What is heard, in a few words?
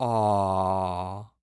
Human Male Ahh